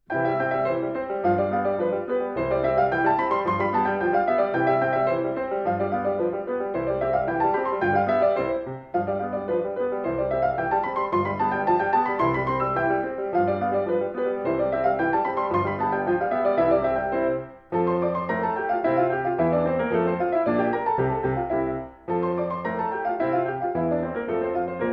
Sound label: Sonata in C major KV 19d Rondo Allegretto